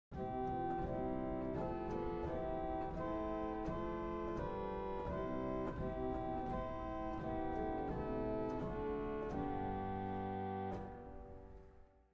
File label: Viole; CavColMainz